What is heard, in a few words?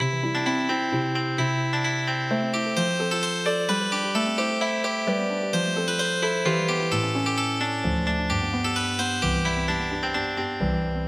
Healing Harp; Ride Cock Horse